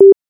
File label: button, oven; prime oven